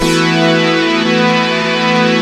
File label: SP-12 KIT; Rubber Chicken Software Sample Bank